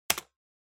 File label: PC-Keyboard (Enter-Hard)